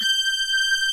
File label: FM SYNTH; OMI - Universe of Sounds